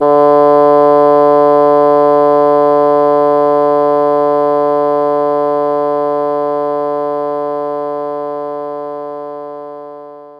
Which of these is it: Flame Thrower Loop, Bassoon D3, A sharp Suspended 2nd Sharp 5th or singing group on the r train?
Bassoon D3